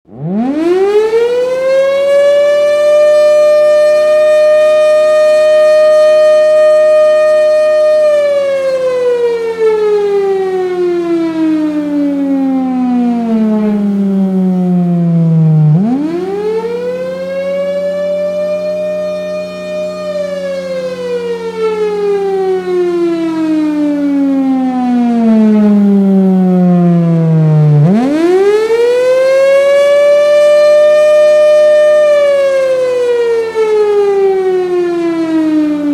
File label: attack tone, sirens; what do the sirens mean